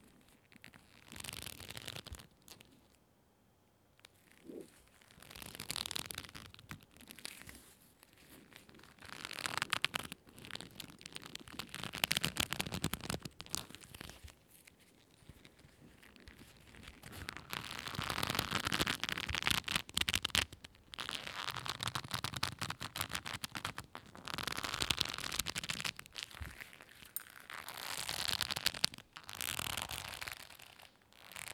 Leather Belt Sounds Squeeze Choking Stangle

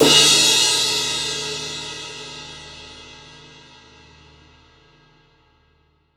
Sabian Aax Crash 18 Edge Powerful

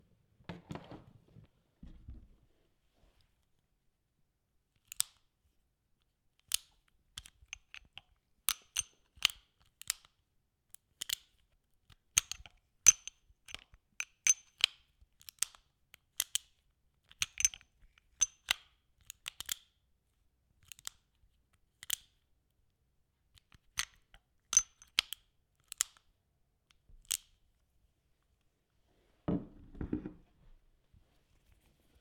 Revolver Reloading Click Gun Pistol Weapon Metal

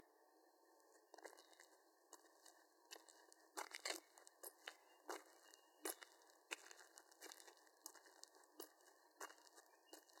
heavy shoes on dirt road

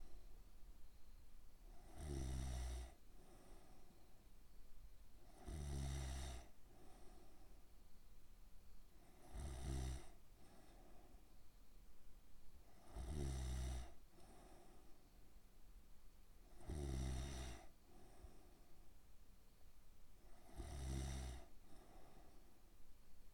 Snore

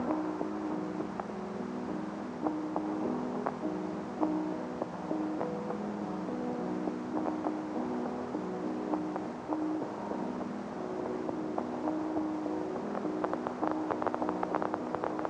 Lofi Piano Loop

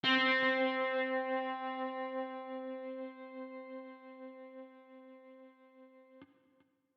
Ambient Guitar C3

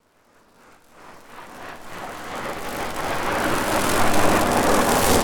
Cinematic Riser

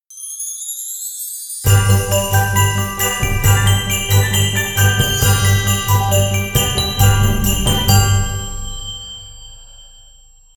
Christmas Intro

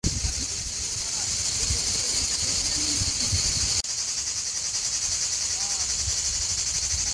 Summer Cricket At The Beach

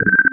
Blip

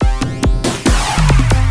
Attack Loop 140 Bpm

